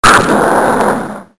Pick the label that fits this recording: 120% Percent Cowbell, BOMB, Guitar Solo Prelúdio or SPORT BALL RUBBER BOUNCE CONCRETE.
BOMB